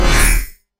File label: particle trail; Sfx; freepixel; Tilter